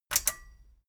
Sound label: metal button stereo click enable fast